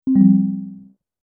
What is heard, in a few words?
receive message